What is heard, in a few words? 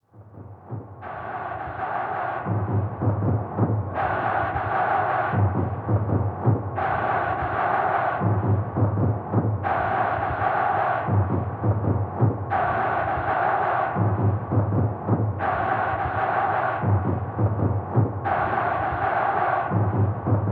Chants; Yanagisawa Chant